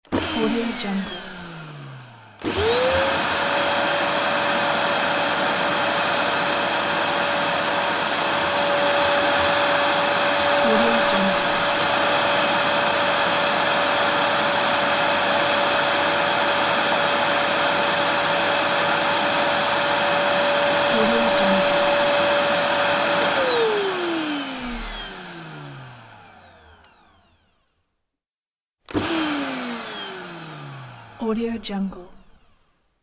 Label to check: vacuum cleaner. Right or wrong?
Right